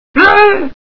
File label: miss; svencoop; uboa; konichiwa